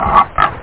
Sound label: pig